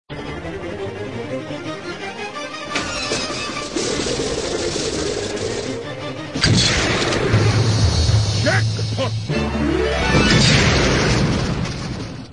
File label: loop jackpot